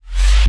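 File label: turret cannon power up; allegiance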